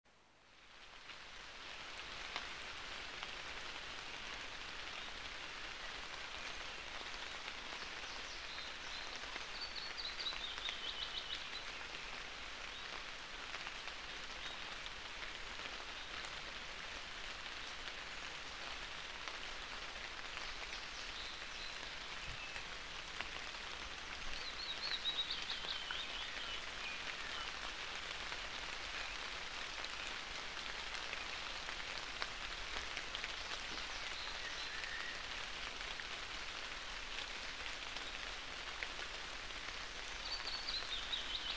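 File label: light rain in the forest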